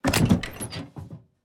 Backpack Open; Custom UI Sounds; Resident Evil 2 (2019)

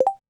Menu Dropdown; Custom UI Sounds; Stardew Valley

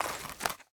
Menu Inspector Window Open; Custom UI Sounds; Resident Evil 2 (2019)

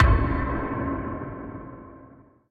Quest Started; Custom UI Sounds; Dying Light

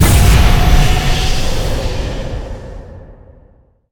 Quest Failed; Custom UI Sounds; Dark Souls 3

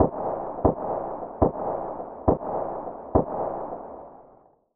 scarh tap slow; scar heavy; weapons; SPT Battle Ambience

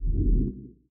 Backpack Open; Custom UI Sounds; The Division 1

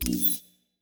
Button Click; Custom UI Sounds; Call of Duty Black Ops 2

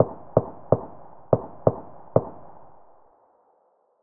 ak74 tap fast; weapons; SPT Battle Ambience

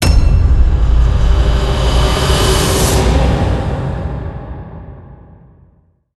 Quest Finished; Custom UI Sounds; Bloodborne

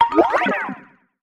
Quest Started; Custom UI Sounds; Slime Rancher

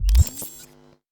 Backpack Open; Custom UI Sounds; Cyberpunk 2077

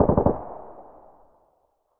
mutant burst short, weapons; SPT Battle Ambience

